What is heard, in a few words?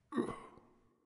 lobby victory cyclops vox; smite; osiris